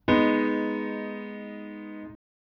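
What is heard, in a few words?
G sharp m lower, chords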